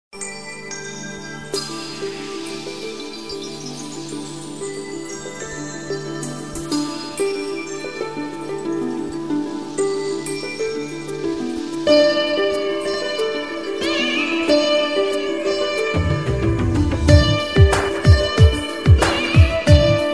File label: Beautiful Melody